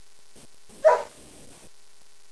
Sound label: DOG; animal sounds